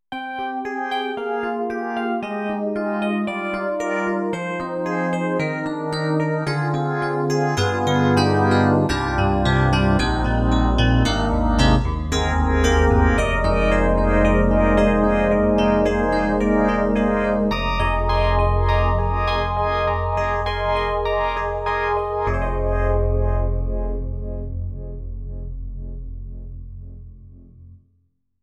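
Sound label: SD-90 Solo FM Hard Electric Piano Phase; EDIROL; FM Hard EP; EP Phase 3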